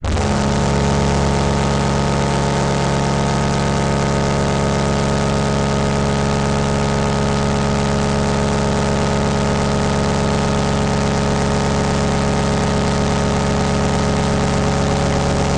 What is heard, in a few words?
Title Transition Stab Dark Long